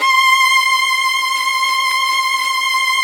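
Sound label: violin vibrato, Orchestral; Sound & Vision; Gigapack I CD 2; Violins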